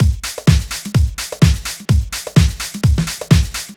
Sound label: Drum Loops, 127 BPM; Sonic Foundry (Sony Creative Software); Underground UK House & Electro